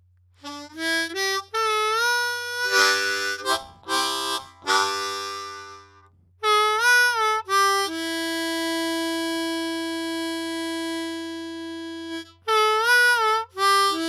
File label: Shure BG 3.1 blues